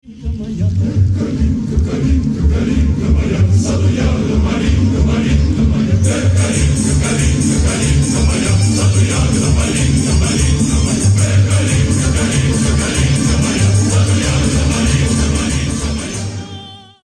CHOEUR RUSSES

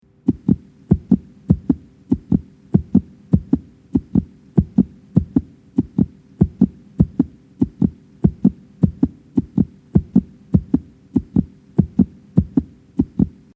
BATTEMENTS DE COEUR

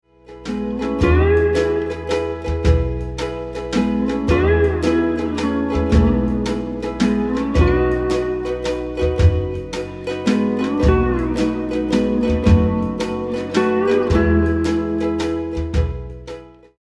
musique des iles hawaii

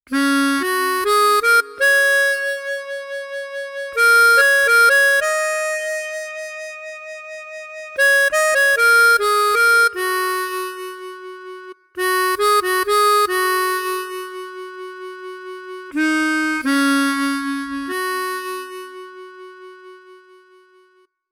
l harmonica

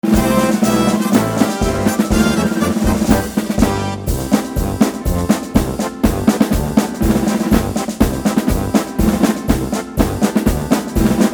musique millitaire

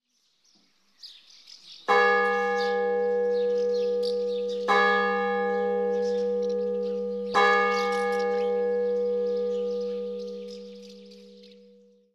CLOCHES EGLISE

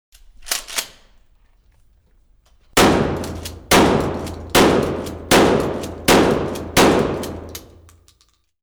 BRUIT DE FUSIL

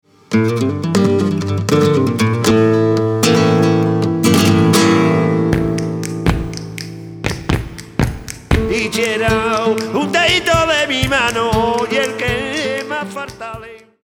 musique espagnole flamenco

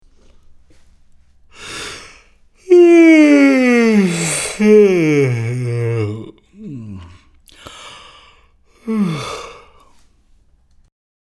HOMME QUI BAILLE

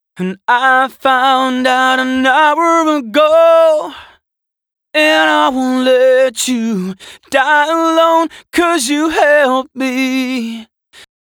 voix chanteur

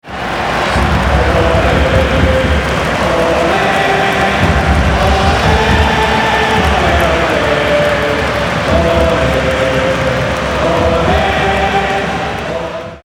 STADE DE FOOTBALL

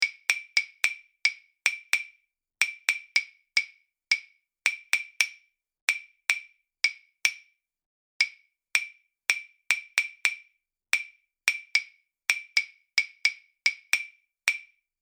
claves

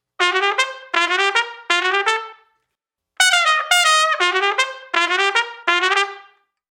trompette

